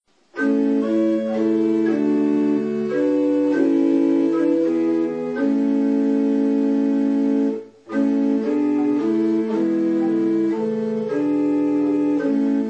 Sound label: communion hymn Come Gracious Spirit